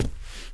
statue walk; Seer; GamigoNA

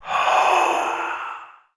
Elf Knight Soul Idle

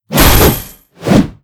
Paladin Skill

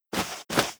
Mech Run

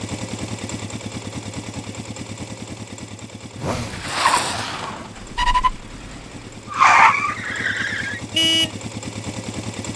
buggy car idle

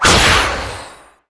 break

